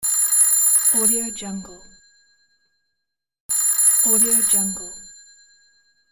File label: rotary phone ringing